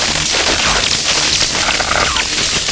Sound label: lance field attack charge, weapons